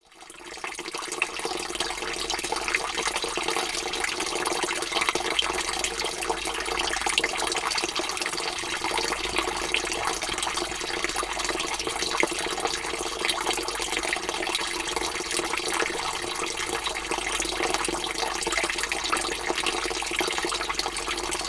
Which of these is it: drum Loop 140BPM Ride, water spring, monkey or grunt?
water spring